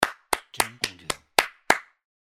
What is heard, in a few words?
Clapping